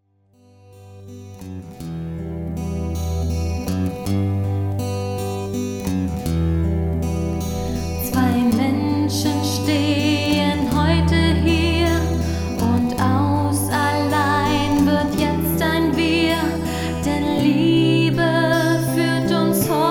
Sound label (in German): halleluja deutsch